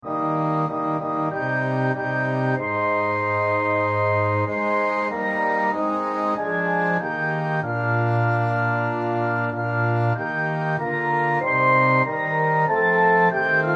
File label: Orchestra; Hymns